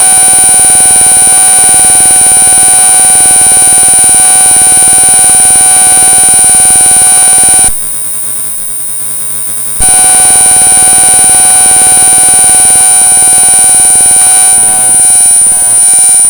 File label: trance sound; bloody brain; full of flesh